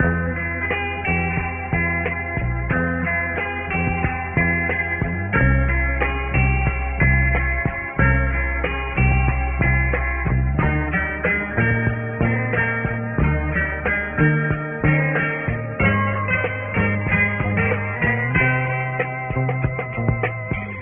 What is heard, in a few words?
guitar, funky drum loops